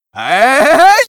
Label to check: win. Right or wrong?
Right